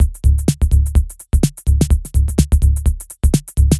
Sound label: Drums; MPRODCLUB